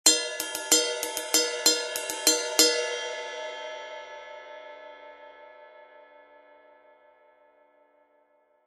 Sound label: 20in Ping Ride, cymbal